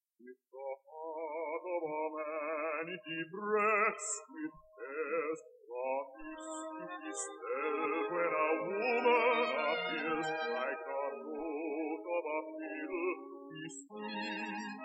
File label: air